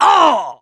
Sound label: hurt major, mechanic; voice